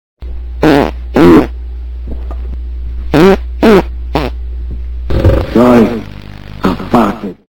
fart sorry

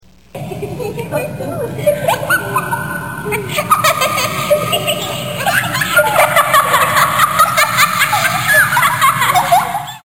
LAUGHING

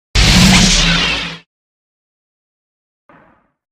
Photon Torpedo Single

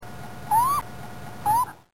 Raccoon Happy

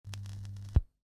needle up

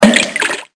drop in water

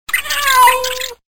cat mouse

